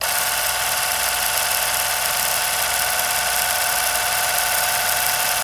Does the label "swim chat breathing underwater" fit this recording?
No